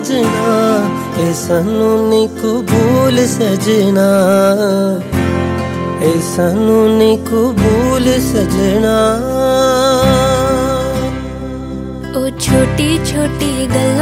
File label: punjabi ringtone